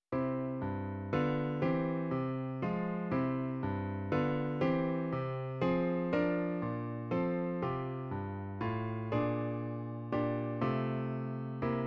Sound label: tones and st, no voice